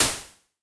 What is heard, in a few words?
Hi-Hat Open; ANTENNAS